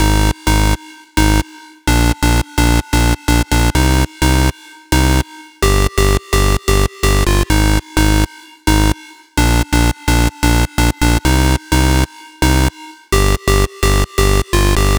Electro Lead, 128 BPM; Vengeance Samples; Vocal-Hook Kits; Song Kit

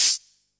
Special Hihats; Vengeance Samples; VEC1 Cymbals

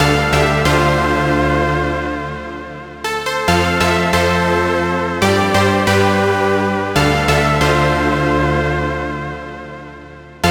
Main Melody DRY 138BPM, Dminor; Melody & Synth; Vengeance Samples; Nightfly Kit

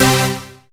Synths C; Vengeance Samples

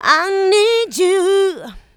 voc, female vox, Vocal House

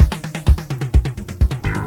Fill Ins 128BPM; Vengeance Samples; VEH2 Loops

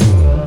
percussion; Vengeance Samples

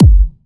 128BPM Kick; Vengeance Samples; VFH1 128BPM Australian Kit

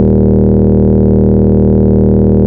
Root D#, Bass

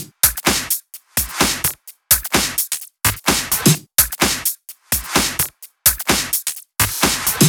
Freak Drums 2, 128BPM; Vengeance Samples; Freak Kit

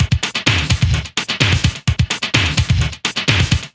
House Beat, 128 BPM; Loops; Vengeance Samples

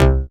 Synth Bass; Vengeance Samples; VEE Synth Shots

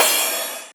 Cymbals crash; Vengeance Samples

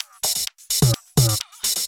Electro Loop 128 BPM; Vengeance Samples

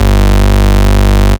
Bass Cuts; Vengeance Samples; VES1 Instruments

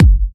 Hard Kicks; Vengeance Samples

